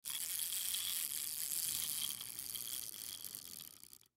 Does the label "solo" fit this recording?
No